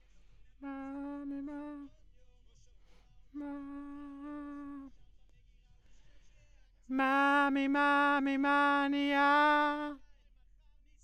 B. Vox

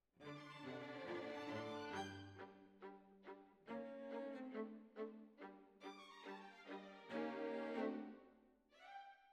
Violas Over

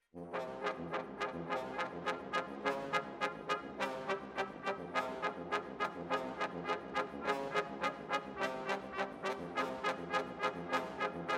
Brass Over

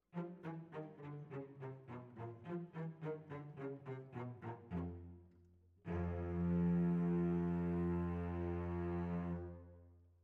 Cellos

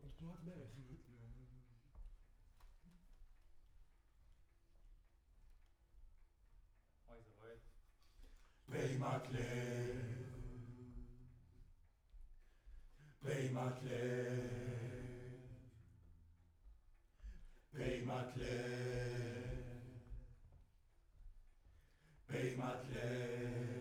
Choir Far

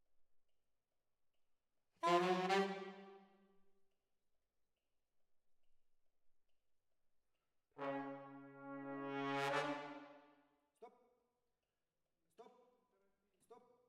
ORTF, Jazz for piano

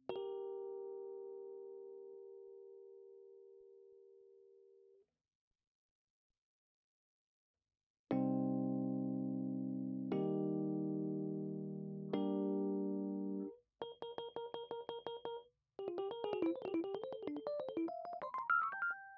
Rhodes

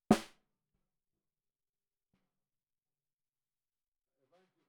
SNR Bot

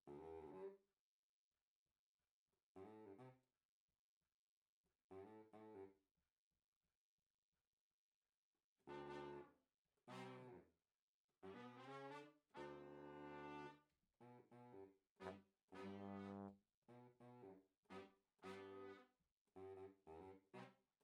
Tromb RE20

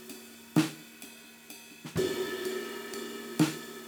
Ride

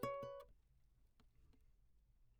Classic Guitar

